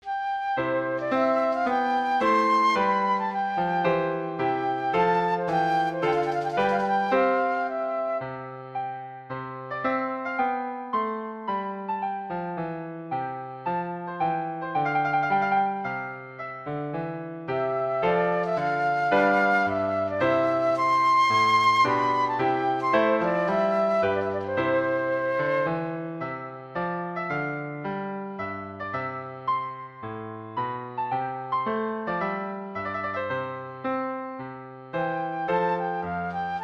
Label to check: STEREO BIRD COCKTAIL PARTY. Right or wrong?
Wrong